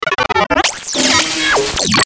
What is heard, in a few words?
Stinger Glitch Zap; Stinger Glitch Stutter Zap; Production Element Imaging Element Accent Transition